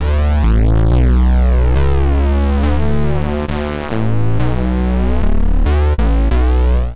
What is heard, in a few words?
Drop D Major 138 bpm, Bassline; MIDI Clips; Tonal; Decoupler; Skitter and Step; Ableton Factory Packs